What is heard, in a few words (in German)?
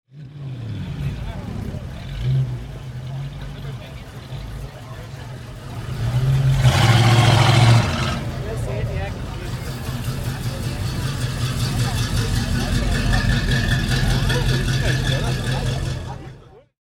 Soundeindruecke American; Amerikanische Klassiker